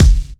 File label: clean bass drum f sharp key; kick drum; kick drum samples